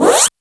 zippy; E-MU Formula 4000 Series Vol. 1; Hip Hop Nation

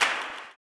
CLAP, Blues Soul; Spectrasonics Vocal Planet

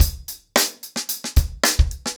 110BPM, acoustic drums; The Roots Drumloops

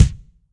KICK, MG KICK

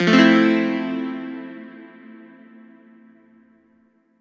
Fmaj7, Chords; Electronisounds

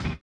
collision; Spectrasonics; Metamorphosis

